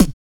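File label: vox percussion, Vocal Planet Jazz FX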